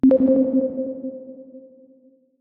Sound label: Ui Confirmation Alert